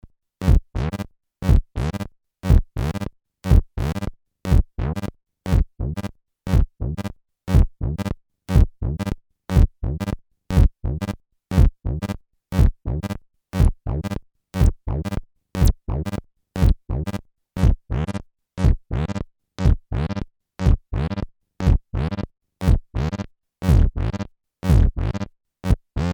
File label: TD-3 Slide Time Function Beat